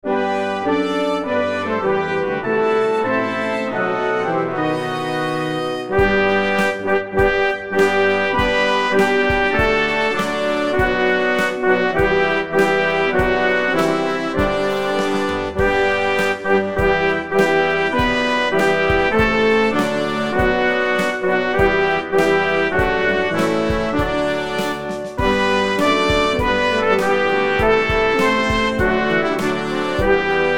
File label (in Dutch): Gezang instr